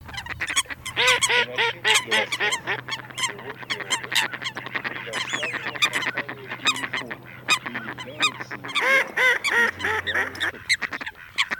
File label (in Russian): Лысуха Folaga + Кряква Germano; plurifon